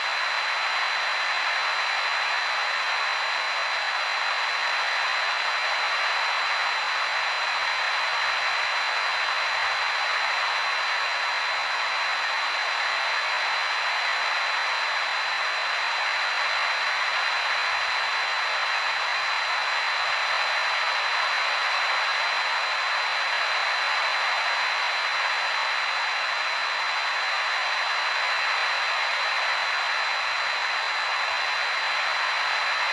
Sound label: CB radio band